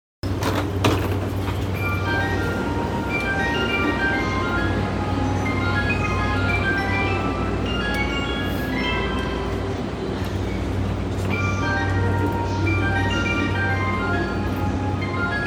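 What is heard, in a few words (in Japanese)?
山手線上野 clockwise, train sounds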